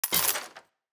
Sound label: forge armor buy; Shelter